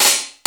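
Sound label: metal snare drum; 90 sSampleCDs; Crypton - DrumBase5000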